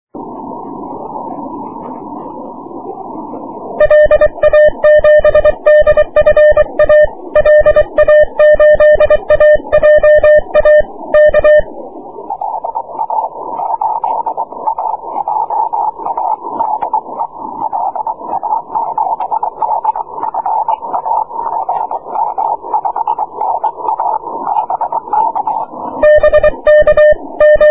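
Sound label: 6m aurora continuous wave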